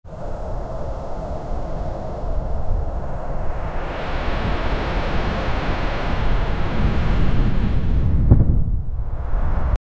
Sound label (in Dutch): harde wind die over zee, gale